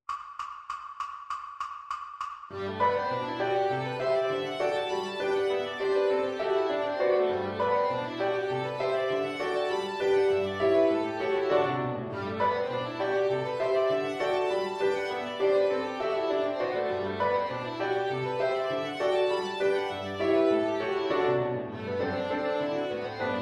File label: Backing Track; Perpetuum Mobile; Schmidt; ViolinSchool